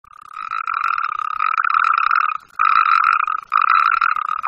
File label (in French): chant B. calamita